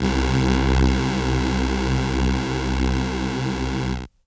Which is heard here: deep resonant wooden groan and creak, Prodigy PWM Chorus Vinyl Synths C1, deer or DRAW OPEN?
Prodigy PWM Chorus Vinyl Synths C1